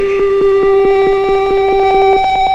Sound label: sequenced beeps